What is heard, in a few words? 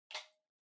Portable Cassette Far Earphones Unplug